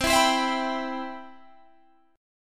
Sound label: C Major 7th, Guitar Standard; CM7 strummed; JGuitar